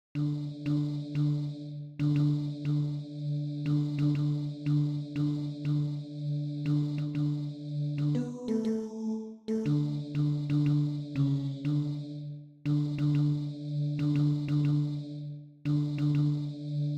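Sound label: baritone; Männer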